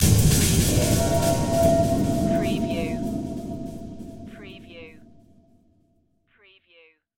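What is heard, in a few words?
Production Element Hits Dark; Sound Effects Bible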